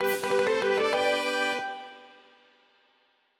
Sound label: Diegetic Music Amusements Ring Of Fire Win